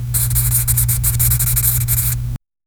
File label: single long stroke crayon, drawing